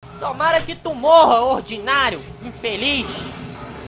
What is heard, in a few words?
Knife old; cstrike; oldtimes eventos